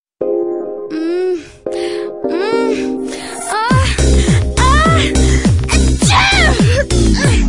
ahh ahh achuu

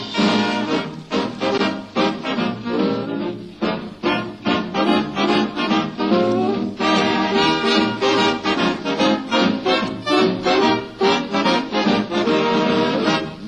Soundtrack

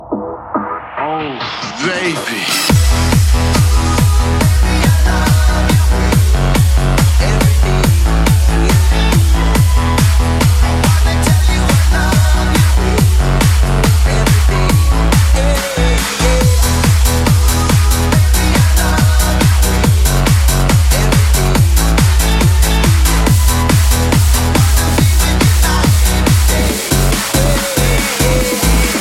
party song, dance

